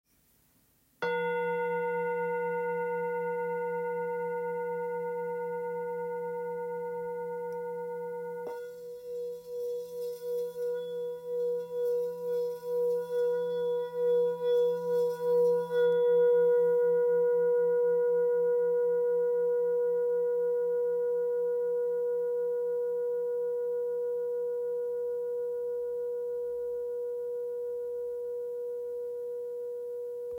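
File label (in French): Bol ancien fa, bols chantants tibétains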